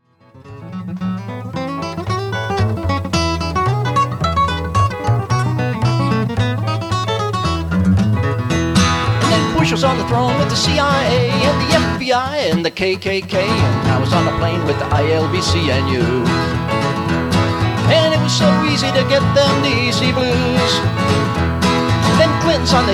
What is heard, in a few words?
D.C. Blues